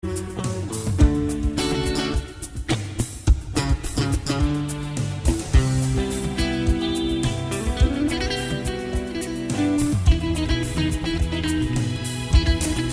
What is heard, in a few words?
Groove